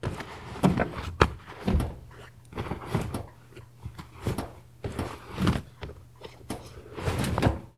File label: Moving Wet Clothes In Dryer